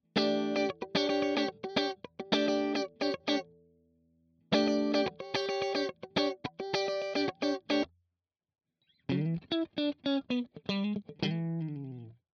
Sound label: Funk Torpedo Orange 2x12